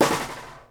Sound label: SNARE, Live Snares